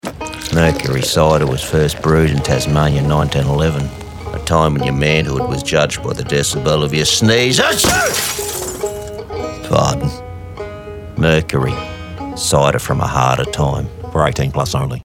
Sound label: Sneeze; Clemenger BBDO Melbourne; The Mercury Cider